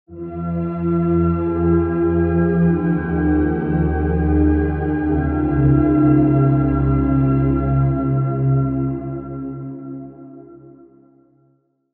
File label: Pad, Implant Reality; Routed Sound; Vital Expansion - Reality Maker Vol.1